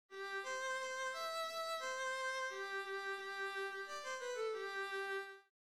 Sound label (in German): Rhythmus Ubungen; Notenlesen lernen